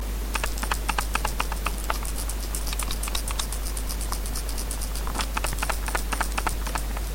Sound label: PowerShot ok